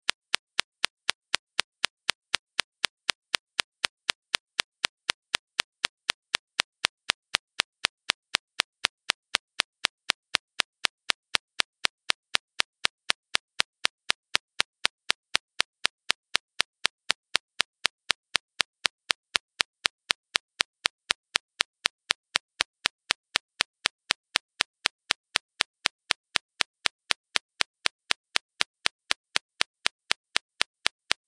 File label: 9 minutes of clicks